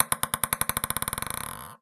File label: Ping Pong Ball Bounce; Balls